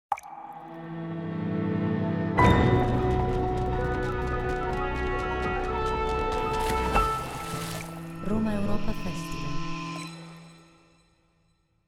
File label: audio logo